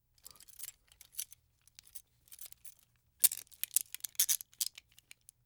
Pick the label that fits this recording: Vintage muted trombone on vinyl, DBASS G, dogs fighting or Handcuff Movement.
Handcuff Movement